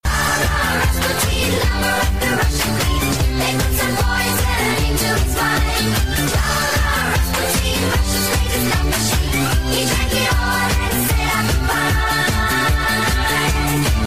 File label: funk overload